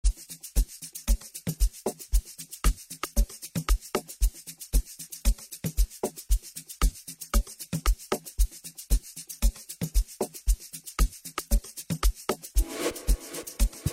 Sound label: Piano Hub Live Stream Mix